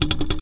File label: Shake Metal Loop; talking tom cat 2 android ios online gamerip 2011